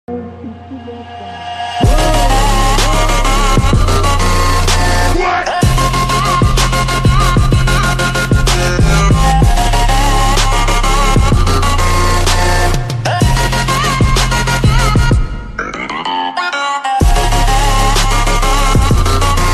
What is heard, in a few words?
ringtone slow reverb